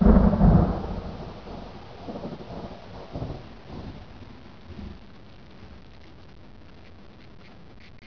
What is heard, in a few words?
thunder storm